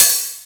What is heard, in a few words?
OP Hihat; Open Hihats